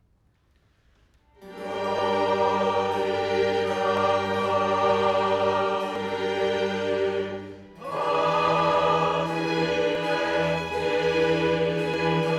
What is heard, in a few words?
chorale; magnificat vivaldi